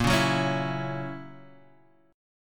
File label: F sharp 7th, guitar